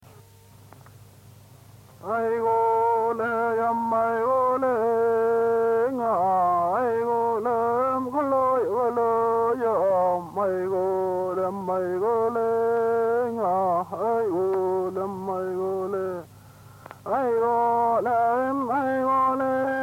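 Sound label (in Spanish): Canto de mujer, Mapuche; Música Mapuche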